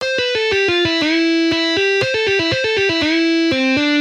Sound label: Easy guitar licks